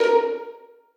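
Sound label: 2nd violins pizzicato a#3; Sonatina Symphonic Orchestra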